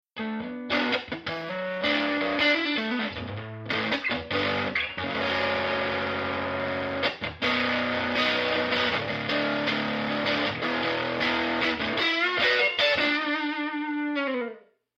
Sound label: overdriven